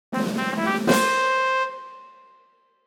成功のファンファーレ

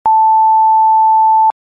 放送禁止用語のカット音

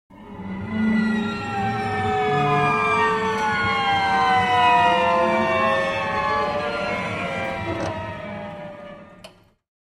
身の毛がよだつような恐怖

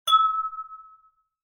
カンパーイ！グラスの接触音

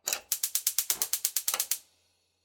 ガスコンロ

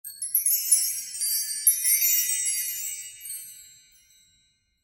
喜びが溢れるような音

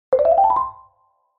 軽い感じの場面転換アイキャッチ

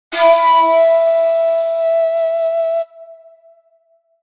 尺八

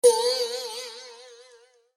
失敗しちゃった

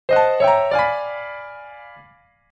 不穏なピアノ危険度レベル

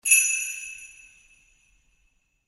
神々しい神楽鈴の音

